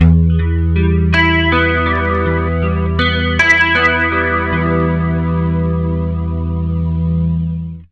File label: 80 MIN ARP E, Acoustic Guitar Loops; USB Soundscan vol.04 - Electric & Acoustic Guitar Loops; AKAI